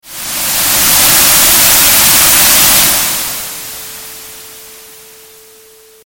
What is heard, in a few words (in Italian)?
Masch sim rumore